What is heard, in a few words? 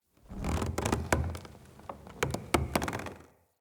Wood Floor Creak; Ambience; Soul Plane; Vendor